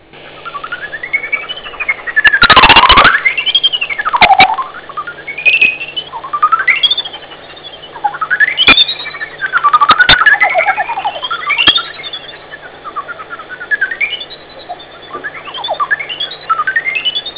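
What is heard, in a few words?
rhinolophus euryale; fauna; sismisi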